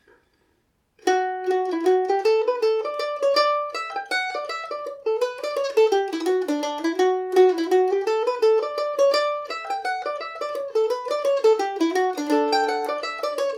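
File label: D major, irish mandolin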